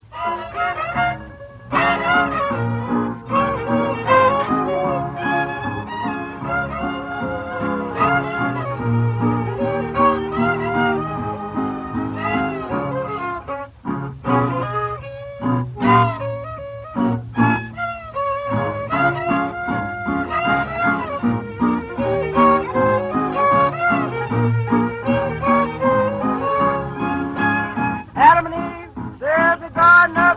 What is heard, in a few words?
violin